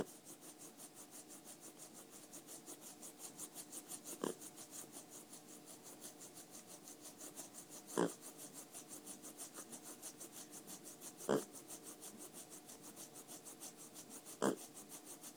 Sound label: uhh uhh uhh uhh content